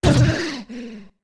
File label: Fall Down; Zora Link getting knocked to the ground (#3 is rarely heard)